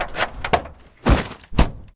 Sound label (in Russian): skripuchaya rzhavaya dver starinnogo avtomobilya